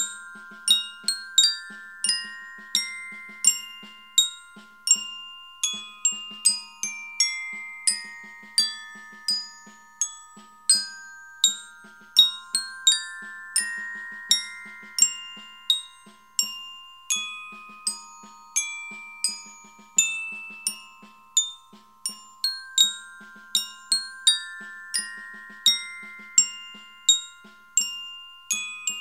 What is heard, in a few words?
Bell 3d